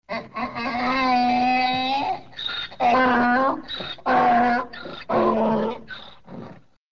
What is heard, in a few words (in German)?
esel